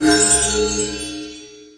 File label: level up